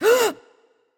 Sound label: breath; Smite; athena